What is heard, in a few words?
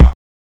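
bass drum; E-Lab; X Static Goldmine 1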